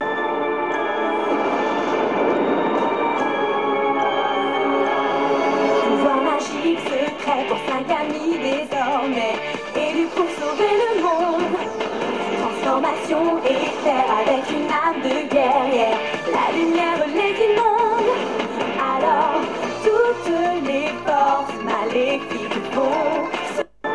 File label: latino